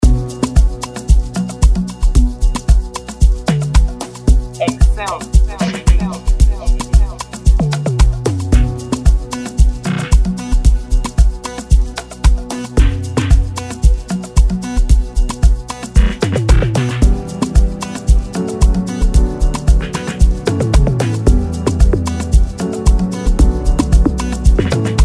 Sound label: amapiano beat